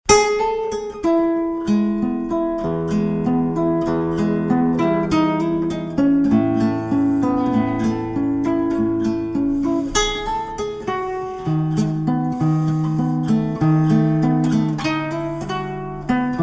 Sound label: pad guitars